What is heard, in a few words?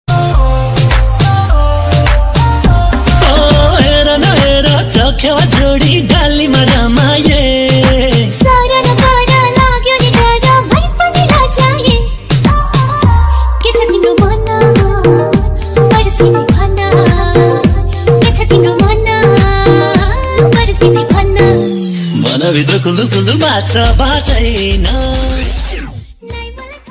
romantic